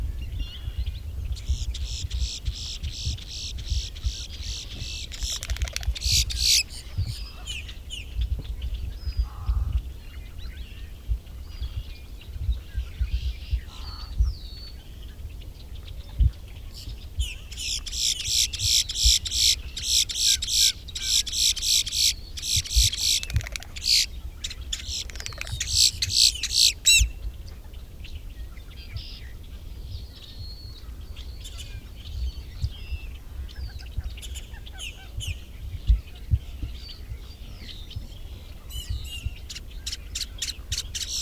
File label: Boat-tailed Grackle Quiscalus major